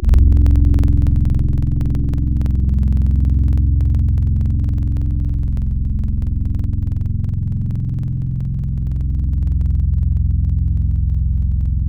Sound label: Kantele Long; Synthetic's Serum Collection; Echo Sound Works Sphere